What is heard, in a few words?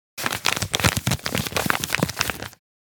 Paper movements crinkling